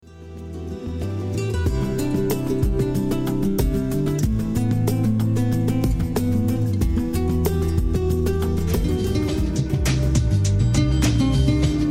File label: Medley Hotel Lounge; Barcelone